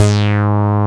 SYN STBAS; Sound & Vision - Gigapack I CD 2; JD 800/990

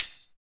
PRC TAMB

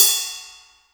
ride, break beats

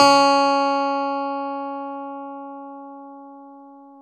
CLV CLAV DA; Roland LCDP10 Keys of the 60s and 70s 2

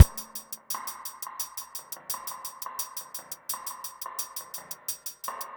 20 MEAN GREEN 86 bpm, Loops Breaks; POTBreaks

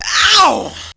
VOX; Zero G; Man Machine

